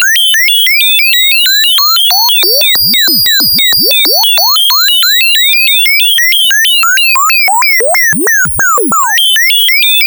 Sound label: RM BEL LOOP, ANALOG LOOPS; Zero-G Ambient 1